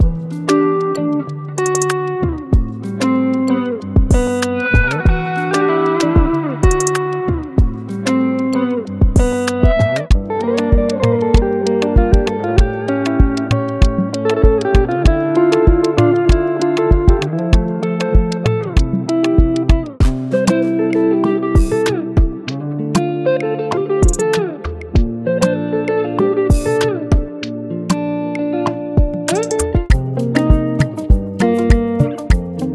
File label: Afrobeats Guitar Melodies; MEMORIES